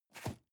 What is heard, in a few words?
cardboard box medium place slide soft; objekte